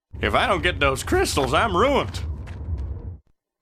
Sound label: Miner reminder (cinematic)